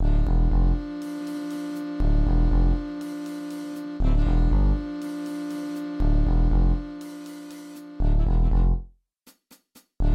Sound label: Spying Jazz